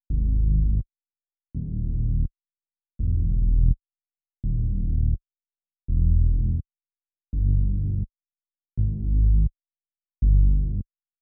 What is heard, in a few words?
166 BPM G# Min Bass; Melodies; Loop Stems; Cymatics; Goosebumps; Pharaoh Premium Drum Samples (BETA)